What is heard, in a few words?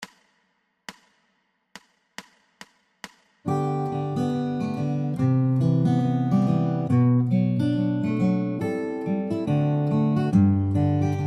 guitars